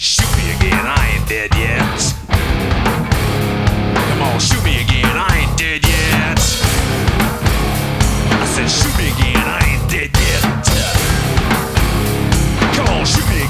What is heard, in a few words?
shoot